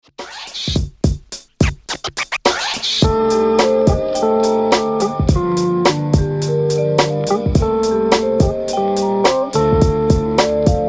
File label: Funk Box, Instrumental